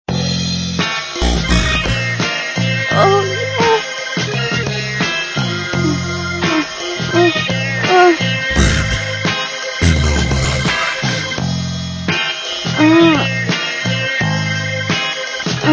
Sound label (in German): klavier